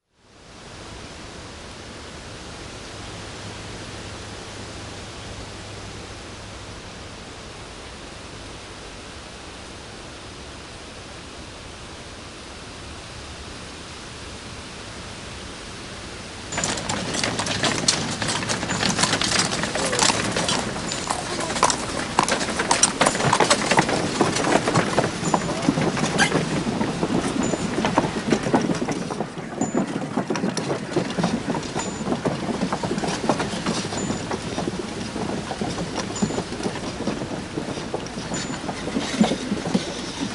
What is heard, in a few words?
maschine